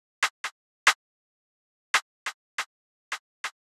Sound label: Drum Loop - 140 BPM Percussion, Drill; Loop Stems; Cymatics; PARADOX II - Drum Loop Collection; Bow